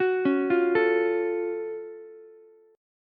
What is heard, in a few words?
F sharp Minor Major 7th F sharp down Ukulele; arpeggiated